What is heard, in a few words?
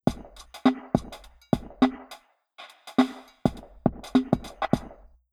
drums impulse 10m centre v shaped; Grange